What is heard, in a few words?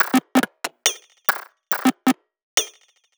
Bpm140 Clink Perc Loop; Perc Loops